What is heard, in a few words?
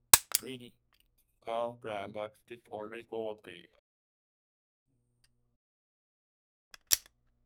gun barrel being loaded